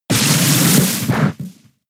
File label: Water Shuriken 1 hit; pokemon sfx gen 6 attack moves xy oras